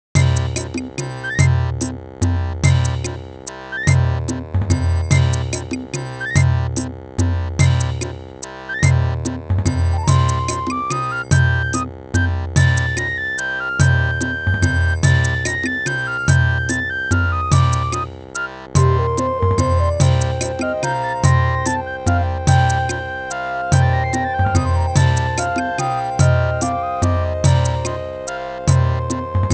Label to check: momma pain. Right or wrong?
Wrong